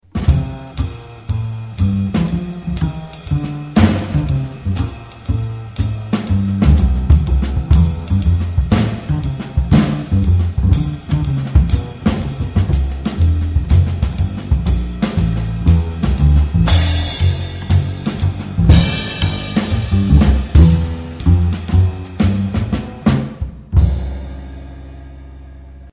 bass drums, jazz

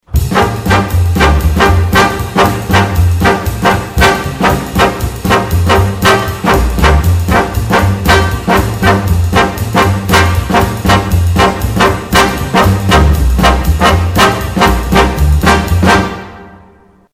brass section, jazz

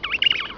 Emperor Chick, pinguins

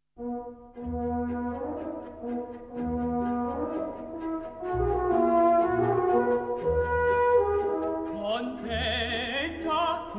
tenor, coral

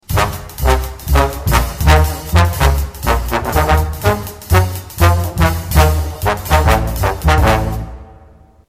trombones

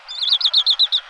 Adelie Chick, pinguins

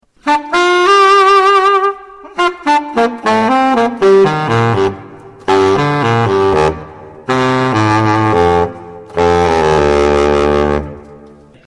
bariton, jazz

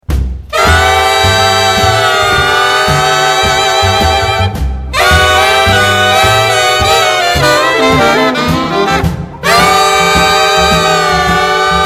reed section

